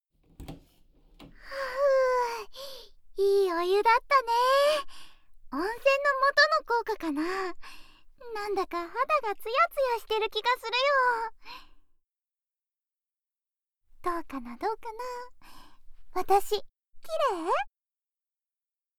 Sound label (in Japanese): 耳舐め前戯